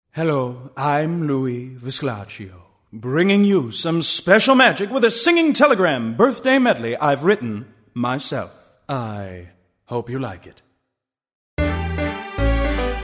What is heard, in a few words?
Singing